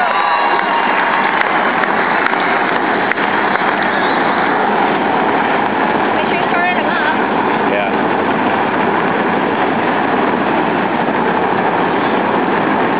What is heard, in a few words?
nascar sounds